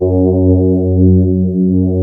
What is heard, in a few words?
CMI ORGAN